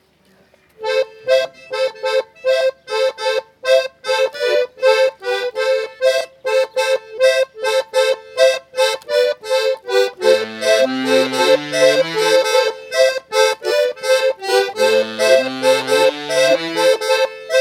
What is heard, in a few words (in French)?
studio accordeons; festival; tour